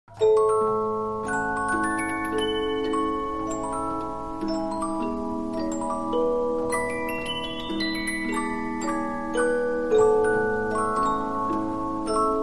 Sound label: Polyphon, 15inch disc musicbox